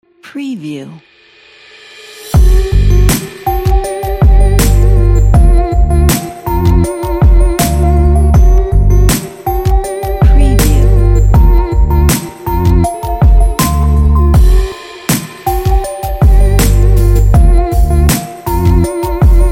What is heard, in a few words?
abstract trip hop